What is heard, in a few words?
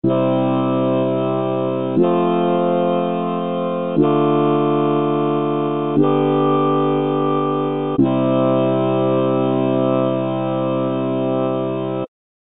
I IV ii V I